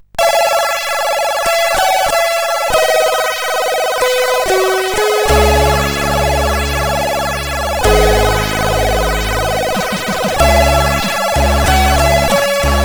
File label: Intro, CSG8580R5; Virgin Intro